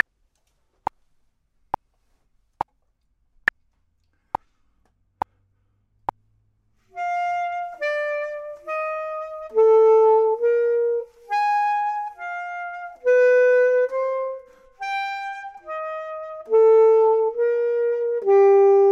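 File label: top, Saxophone and Clarinet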